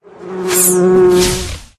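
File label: wasp attack middle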